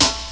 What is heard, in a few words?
GARAGE SD; 90 sSampleCDs; Crypton - DrumBase5000; GARAGE STACK